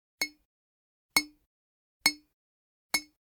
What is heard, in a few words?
Generic Click Glass Small